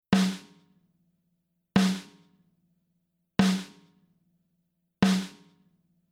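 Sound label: Comp Ratio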